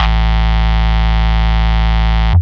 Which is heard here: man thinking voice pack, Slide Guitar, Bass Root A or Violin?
Bass Root A